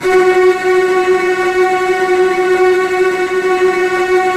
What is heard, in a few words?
F#3, Virtuoso Strings; TRM FX; ILIO; Kirk Hunter Virtuoso Strings VOL-2; 90 s Sample CDs; 10CL TRM FX